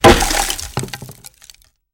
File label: Axe Chops Wood Heavy